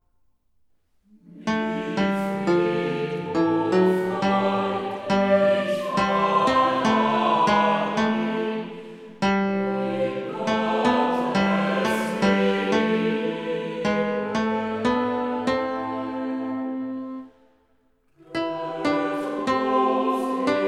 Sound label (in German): Tenor; Brahms